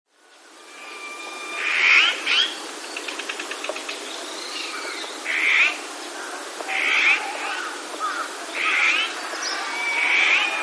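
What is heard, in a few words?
azure-winged magpie; オナガ; ギーギーという声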